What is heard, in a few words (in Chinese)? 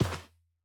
骆驼沙地脚步声